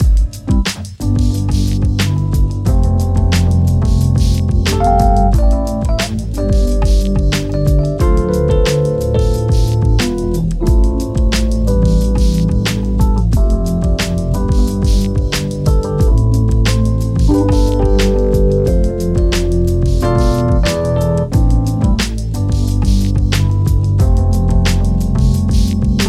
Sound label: hiphop; diva